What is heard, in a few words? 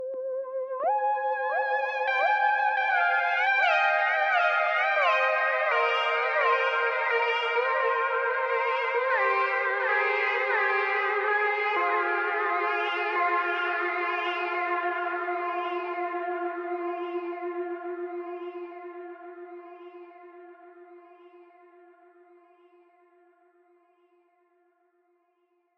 Waldorf Pulse